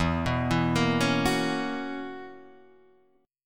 B Major 7th Suspended 2nd Suspended 4th E, chord shape